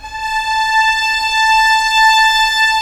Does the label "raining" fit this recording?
No